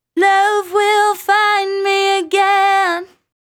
110 Ab Lead Fem Voc Dry

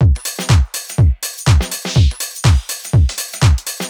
Drum Loop Full, 123

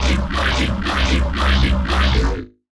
C Bass Hit

